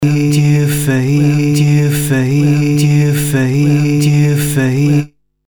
Ensemble Cross Fade Loop

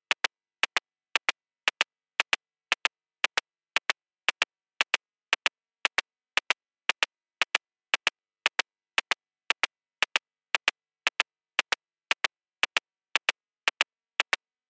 Shaker dry; CwejmanS1

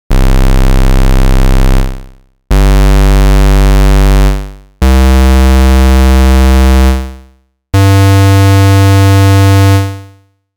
Arturia Modular V3; SIGNAL FLOW PART 2

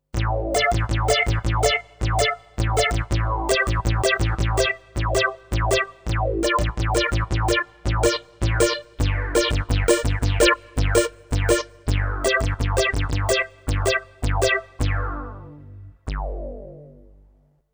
Resonant Filter; WAVeBOY Industries

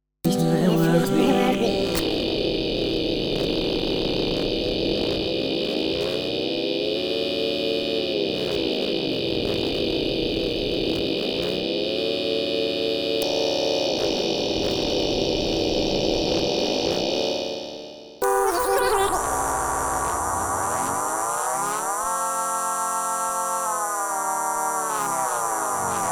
Loop End Modulation

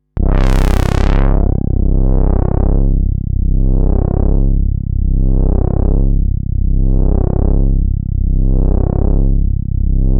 WEAK SYNC PAD Model 15

